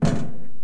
pas metal; kronos; WehikulCzasu; Time Machine; Bruitages

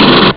mitrail; Flashback Demo

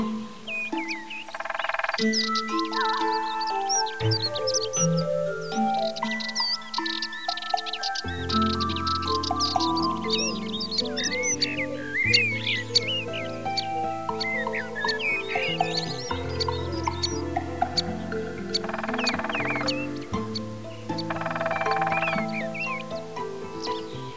ambiance

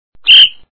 SIFFLET; FlashFootball; Esportes

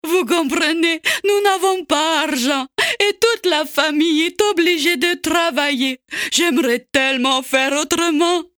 Pleure

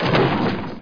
vitrine

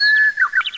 oiseaux; Mobiclic46

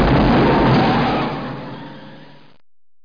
EXPLOSE

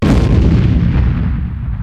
EXPLOSE; Profanglais

